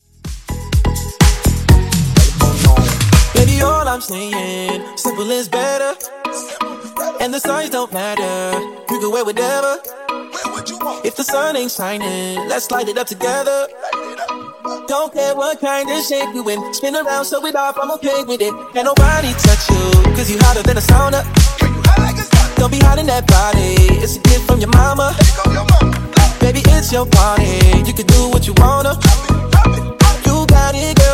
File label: Funk Mash Up Remix 125Bpm